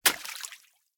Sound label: bolt water, material; STALKER; IWP